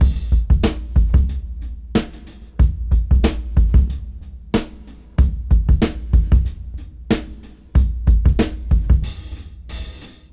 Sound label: Rap 93 BPM; AMG - Keith LeBlanc Phat Butt Jams